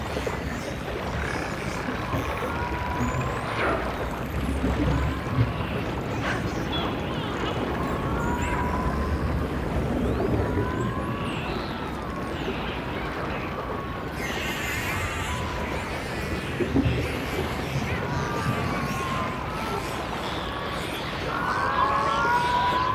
Gentle Dolphins Whales, Asian Zen Spa Music Meditation